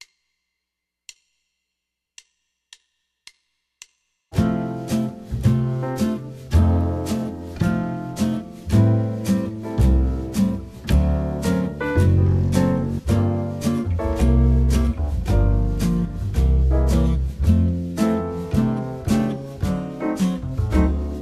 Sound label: swing me baby!